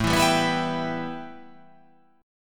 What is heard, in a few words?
A 7th Suspended 2nd